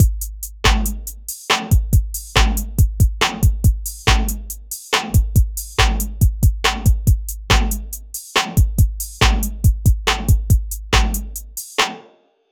a musical beat in style, rap

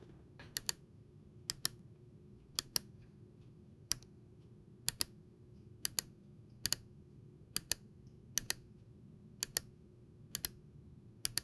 button click sound; user interface

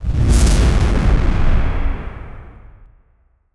a futuristic explosion

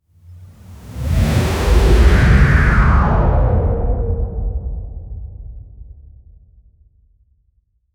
cinematic fx downer sound

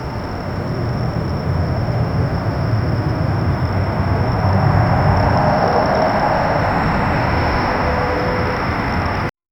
dubai skyline building ve

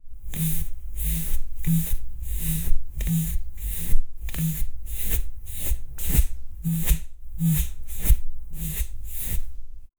realistic binaural asmr, massage

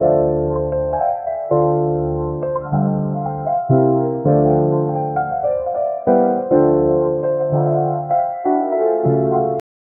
laid back funky lo fi wit, electric piano

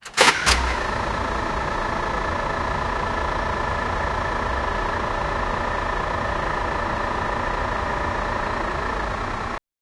the sound of a truck, engine start

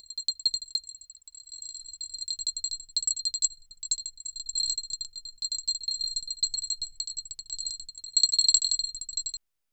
crystals

